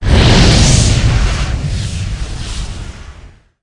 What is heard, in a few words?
fire; doom3; archvile